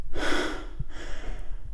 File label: male, Realistic Fatigue Voice; oblivion